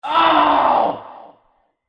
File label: scream